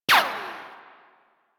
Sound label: Weapon Hit Effect Metal